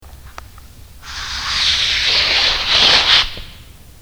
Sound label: shoe slide